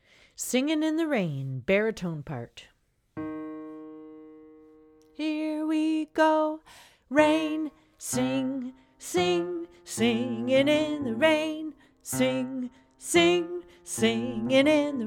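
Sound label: bari, Practice Tracks; berwick-choir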